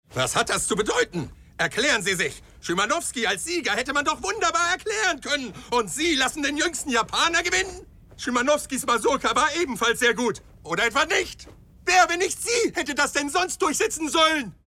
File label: Forest of Piano